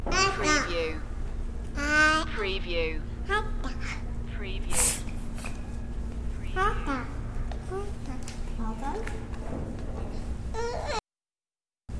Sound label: Infant Voice